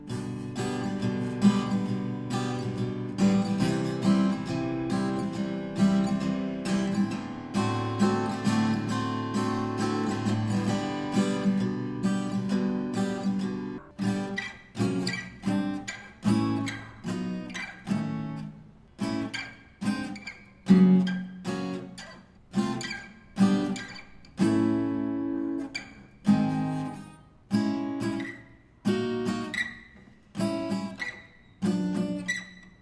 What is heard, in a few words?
string noise